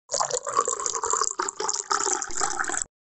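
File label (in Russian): налейте воду в кружку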